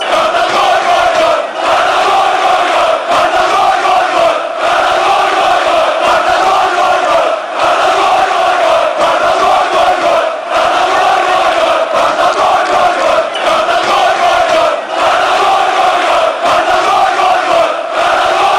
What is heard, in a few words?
goal goal goal, chants; kartal